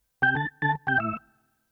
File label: Cruisin' Disco Organ, 70s Analog Funk; Sonic Foundry (Sony Creative Software); What it Is!